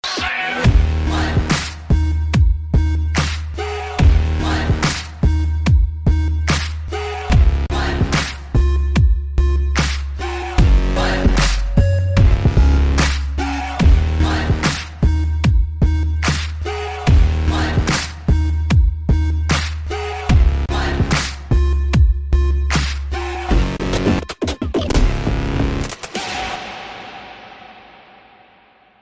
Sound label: Dubstep Ringtone